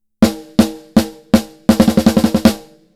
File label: Snare Crack; Oxygen